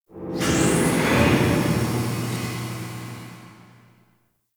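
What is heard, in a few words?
Large Cargo Room Random Ambience; Warframe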